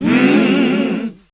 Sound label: Voice Spectral, H PHRS